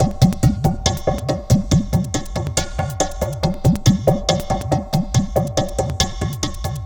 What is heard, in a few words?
Terrestrial Hybrid, Processed Percussion